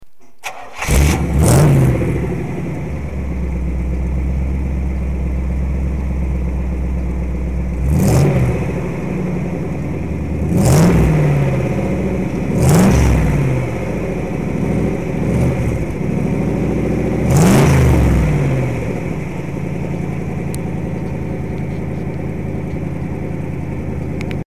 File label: chevrolet monza spyder 1978